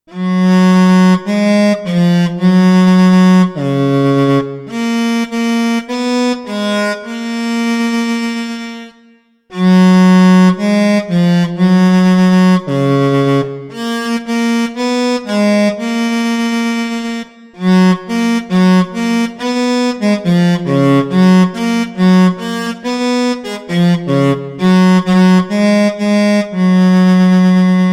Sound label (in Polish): cello; Wsrod nocnej ciszy; Koledy